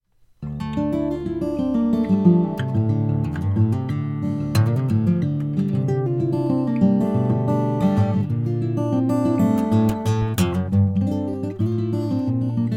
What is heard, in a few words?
acoustic guitar; lewitt; lct840